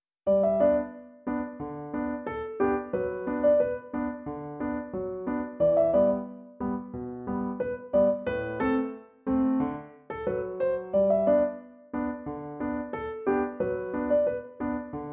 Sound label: Piano Version